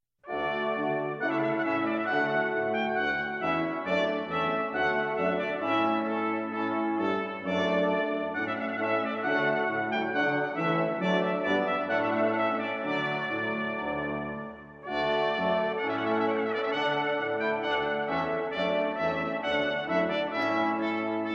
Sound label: Trumpet Voluntary